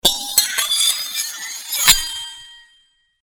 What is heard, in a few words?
Sword Sound; FXHome; Fighting Sounds